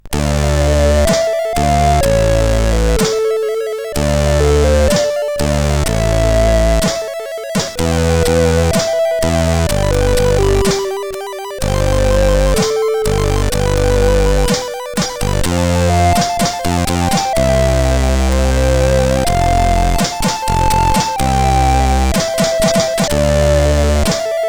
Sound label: Intro Tune, CSG8580R5